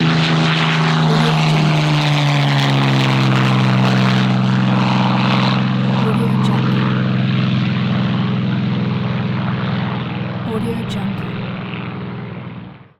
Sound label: propeller airplane; propeller airplane ii